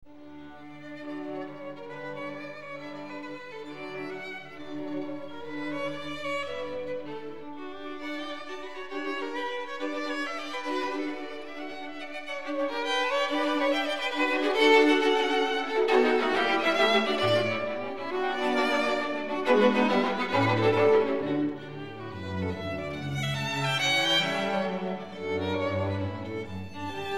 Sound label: String Quartet in C-Sharp Minor, Allegro molto vivace; String Quartet No. 14; Op. 131; Ludwig van Beethoven; Beethoven - String Quartets Nos. 1-16